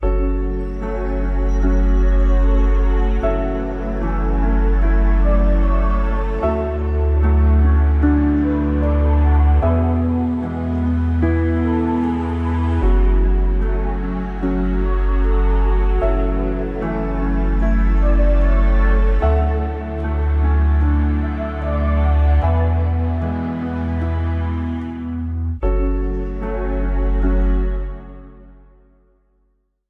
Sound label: slow; yuttari sound